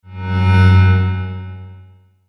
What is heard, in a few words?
Swell Tonal Robotic Electrical